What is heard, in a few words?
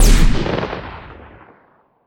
Sci-Fi Weapons-Assault Rifle-Single Shot